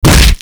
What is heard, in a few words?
Grineer Body Check; Warframe